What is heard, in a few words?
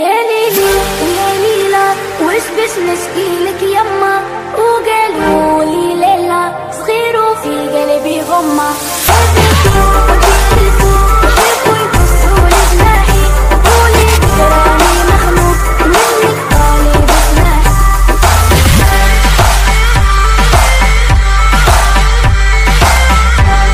remix dj english ringtone; bollywood ringtones